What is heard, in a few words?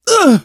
hurt minor, survivor voice, manager